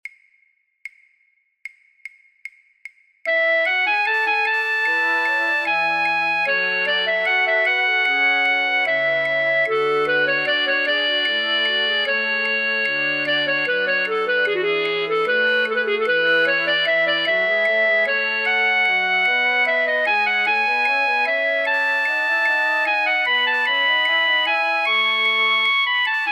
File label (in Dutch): Ritournelle flute choir voor oefentracks fluit; Rameau; Platee